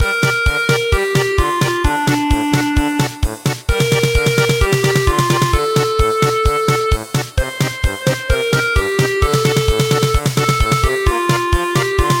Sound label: chirp chirp chirp German Polka